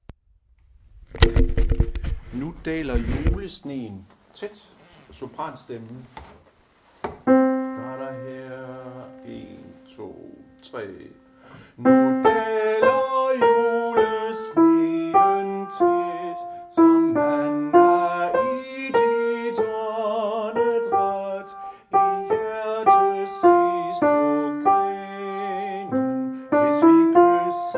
sopran; Nu daler julesneen ned

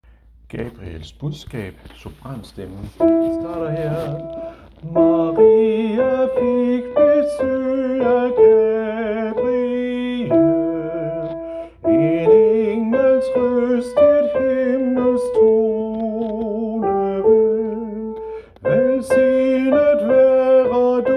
sopran, koret; Gabriels budskab

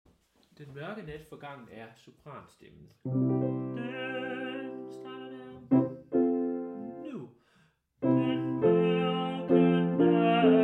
sopran; Den mørke nat forgangen er; harlev koret

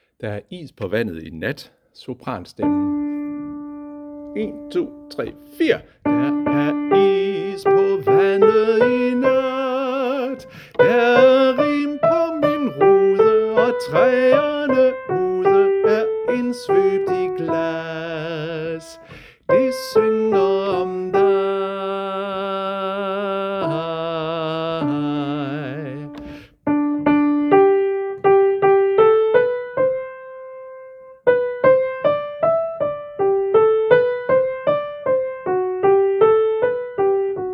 sopran; harlev koret

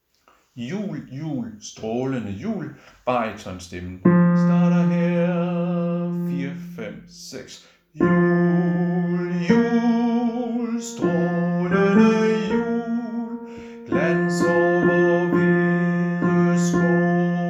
Jul, jul, strålende jul! Baryton; harlev koret

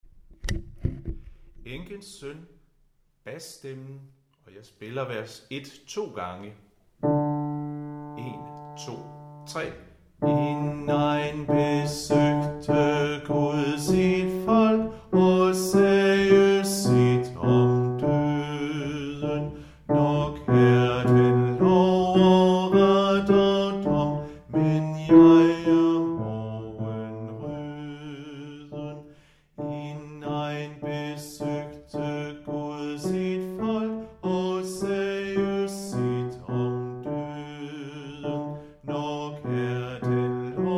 basstemmen; Enkens søn; harlev koret

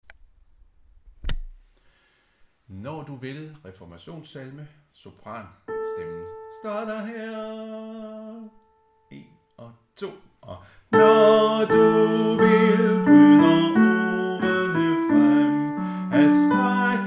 sopran; Reformationssalme; harlev koret

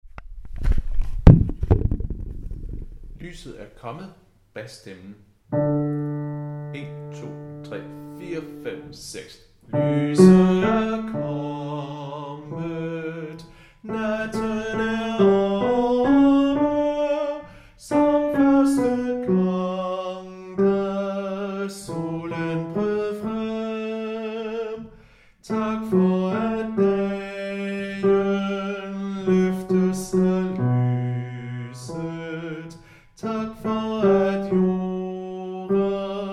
bas; Lyset er kommet; harlev koret

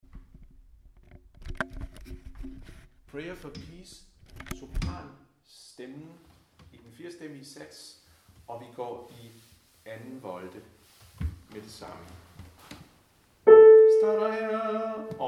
sopran; Prayer for peace; harlev koret

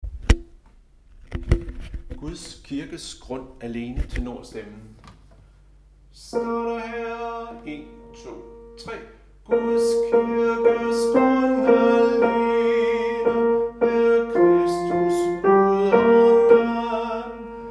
tenor; Guds kirkes grund alene

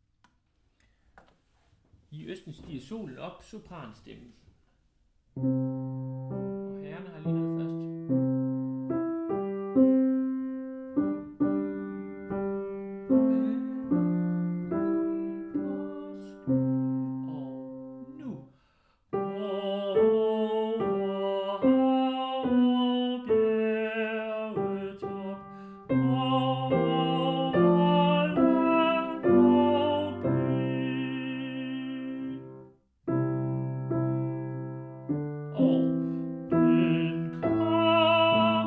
sopran; I østen; harlev koret

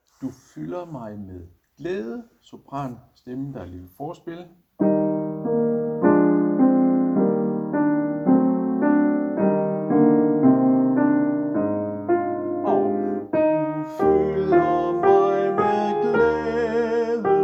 sopran, koret; Du fylder mig med glæde; harlev koret

